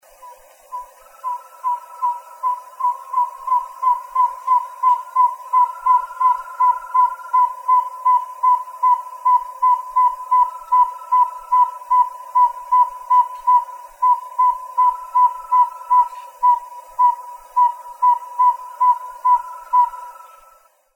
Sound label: Aegolius ridgwayi